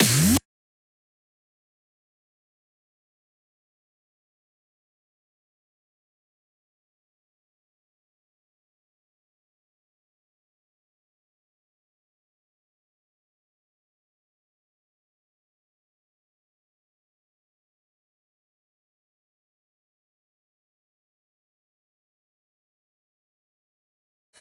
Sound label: fill